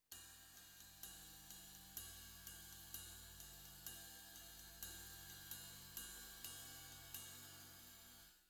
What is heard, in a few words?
Floor